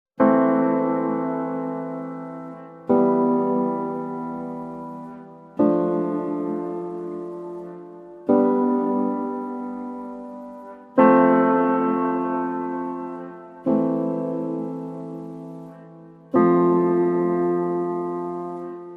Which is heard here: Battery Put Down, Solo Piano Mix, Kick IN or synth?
Solo Piano Mix